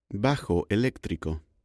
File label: musical instruments